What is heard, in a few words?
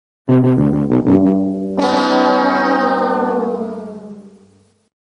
horn; cave